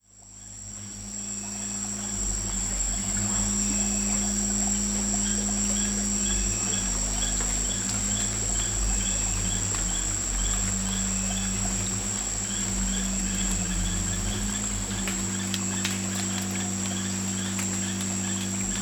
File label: tamya soundscape left right